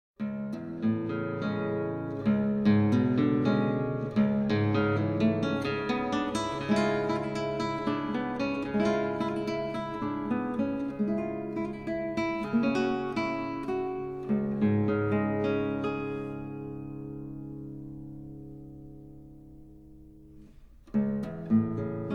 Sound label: guitar